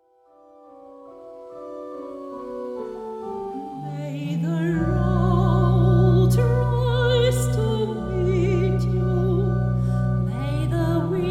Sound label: old irish blessing, sopranistin; fuer die kirche